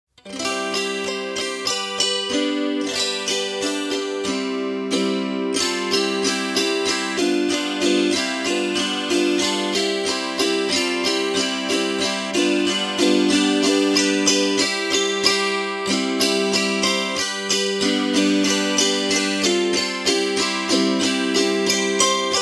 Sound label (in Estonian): Reinlender, kannel, folk; Jaak Kodar; Kadri Lepasson